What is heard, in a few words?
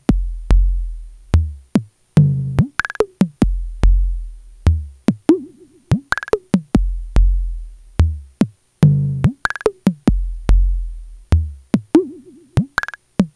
mono kicks